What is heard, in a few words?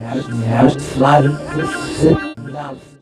VOX FX, Funky Vocals; USB Soundscan vol.18